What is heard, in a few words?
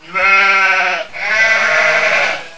baa